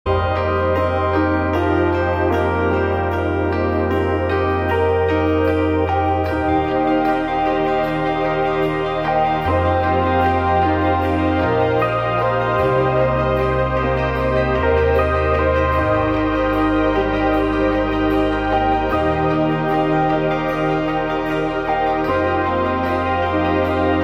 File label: ambient